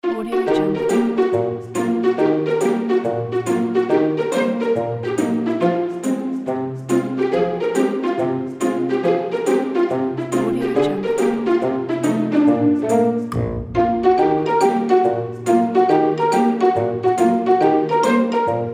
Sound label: comedy loop; plaincask